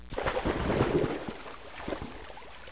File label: mizu